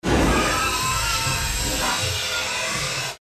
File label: hydraulic squeeking